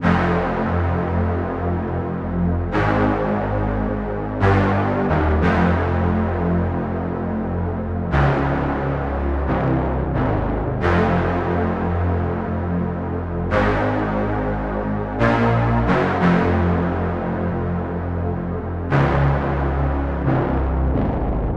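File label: bass synth loop horn sea, 89, F minor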